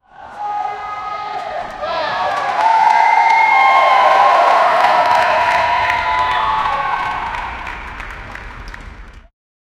applause from stadium

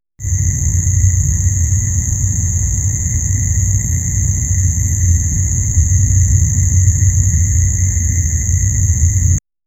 crickets at night faint s; insects

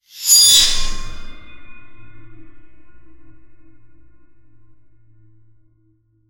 3 mystical sword slashes; arcane

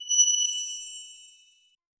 reward sound bold shimmer; triumph

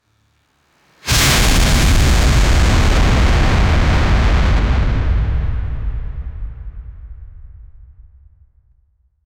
produce a dramatic anime, shockwave

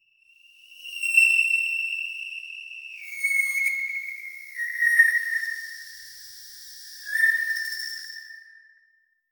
flapping bat wings

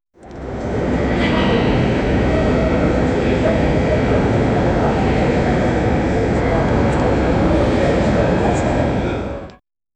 a vast echoing murmur of, voices

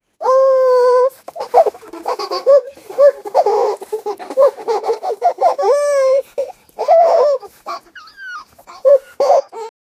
two puppies are giggling; puppy

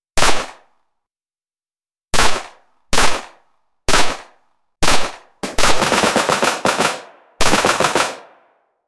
its a shootout, pistol

